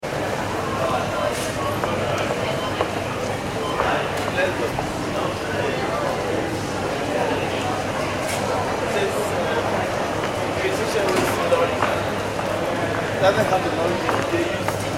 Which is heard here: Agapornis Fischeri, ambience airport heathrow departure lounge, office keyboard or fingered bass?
ambience airport heathrow departure lounge